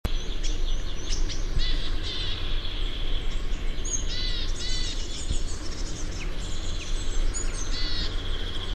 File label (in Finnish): viitatiainen; hömötiaisen kutsuääntä; Syyskuu 2009, Imatra; Jarmo Pirhonen